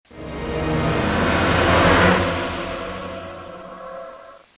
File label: Dramatic Tones